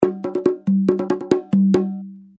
Conga Solo; rocktopus

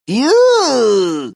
incorrect response; codeapillar

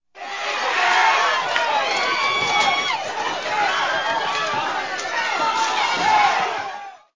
screaming crowd

